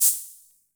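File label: Olson Cym A, cymbal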